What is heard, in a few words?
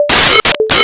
alert